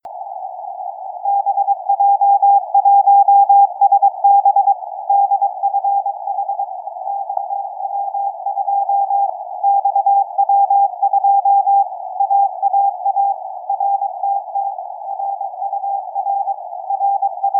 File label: XW2A CAS3A VERT 145mhz660 cw